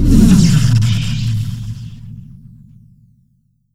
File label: flight broken stop, tardis; p00gie